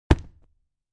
Cloth Character Movement Foley Whoosh

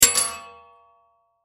Bullet Shell; Bullet Shell 3030 Drop Metal Catwalk Ring; Drops (Sound Effects:Objects); Blastwave; Warfare

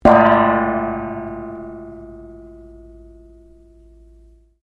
Cymbal 6 inch bent, cheap brass cymbal; Cymbals; Musical

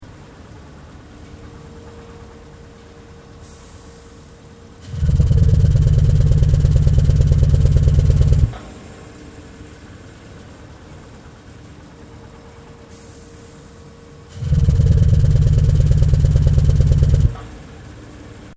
Industrial Machinery Unknown Cyclic Loop; Various industrial machines

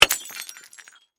Impact Glass Smash, Hard; Smashes (Sound Effects:Objects); BlastWave

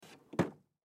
Desk Door Close Victorian Cylinder; Victorian Cylinder Desk 1875; Miscellaneous Door; foley; SoundEffects-Blastwave

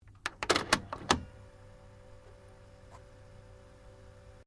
Stereo Akai Mini Tape Deck Play; Multi function music player; Tape Players; Electronics

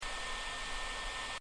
Hairdryer: Domestic, Handheld, Running; loop; Miscellaneous Electronic; Electronics; Stuart Duffield

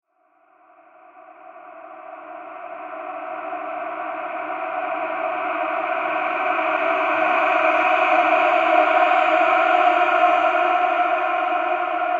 Horror Scream Effect Reverb; Screaming Sound Effects; Screaming (Sound Effects:Voice); SFX Bible 6

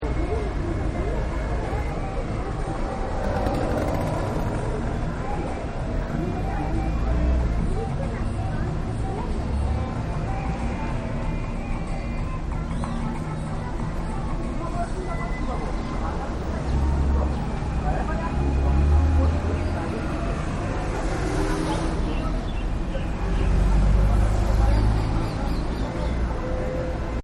India Busy Street; Cities; Backgrounds; India Busy Street 2; City

